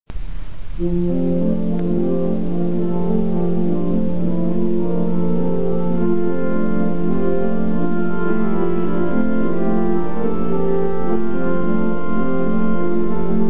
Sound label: Hymn, organs